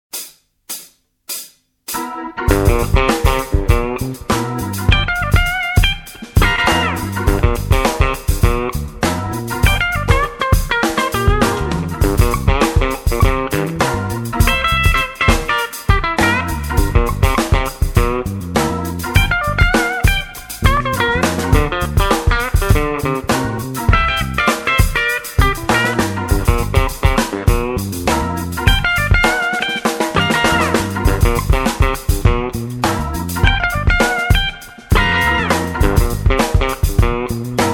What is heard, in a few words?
blues jazz